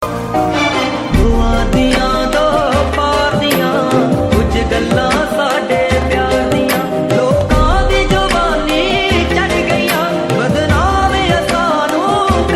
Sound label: punjabi ringtone